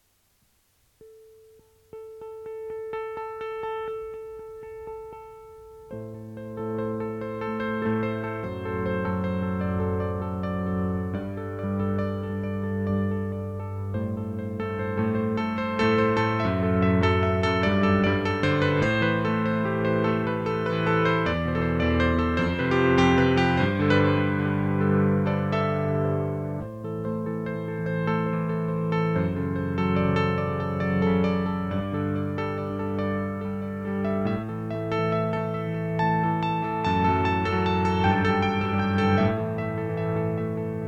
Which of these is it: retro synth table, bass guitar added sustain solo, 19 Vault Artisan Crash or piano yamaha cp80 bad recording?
piano yamaha cp80 bad recording